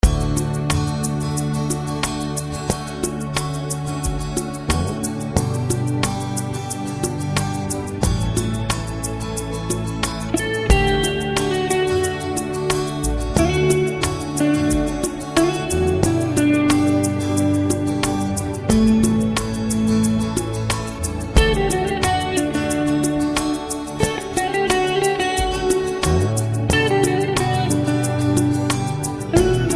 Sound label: ballad, music